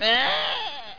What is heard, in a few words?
sheep